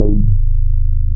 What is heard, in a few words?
BAS MINI, Mini-Moog